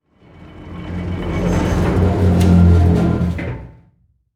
Weight End

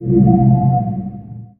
Spiral Timer Glow

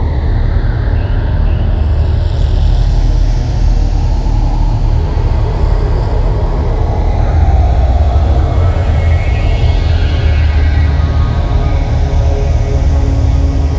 Sphere Amb Loop

